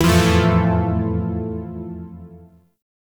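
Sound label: Shawzin Major Chord; Zariman Shawzin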